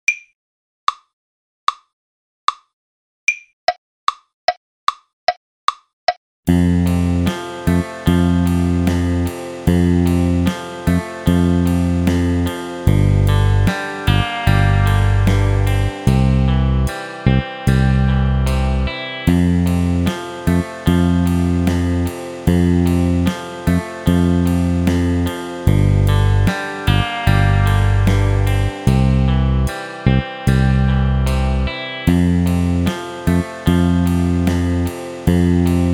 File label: Quaver 4 bar Phrase